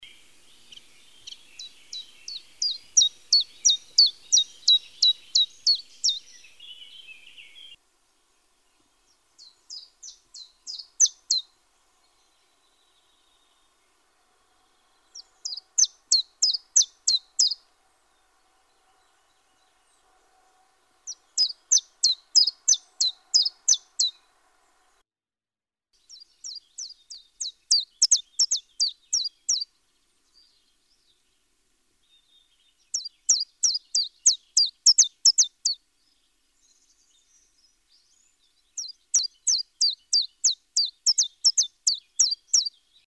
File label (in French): Pouillot veloce, chant